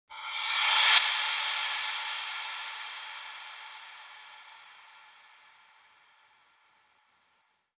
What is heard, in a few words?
Reverse FX 140BPM; Sound FX; G minor; Vengeance Samples; VTS1 Devastating Truth Kit